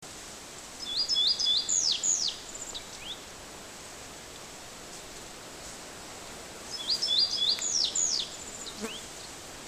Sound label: Emberiza rutila song